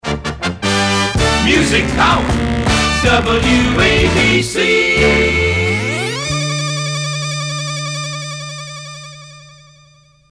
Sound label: Shout Power Air; WABC; PAMS